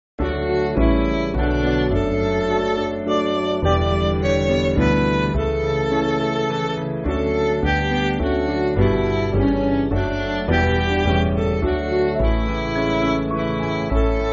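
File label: Midi, Music Backing Files for Churches; Just As I Am; Saffron Walden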